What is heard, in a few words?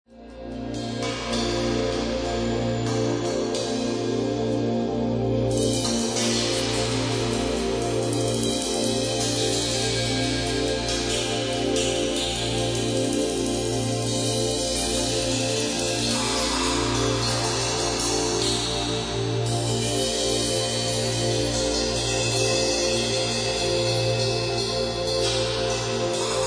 Cymbology